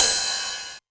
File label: 909 Ride, Drum Machines; Novation DrumstationV1